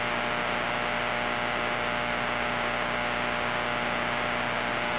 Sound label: RFI S7; n8xe